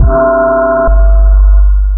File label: sonar Ping Suit Close Beacon; Barotrauma; Real Sonar